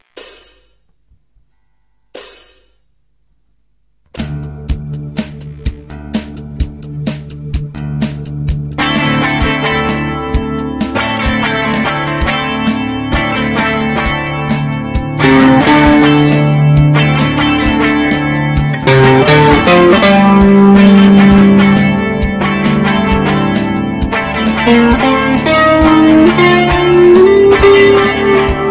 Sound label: drums keyb bass git rhyth git solo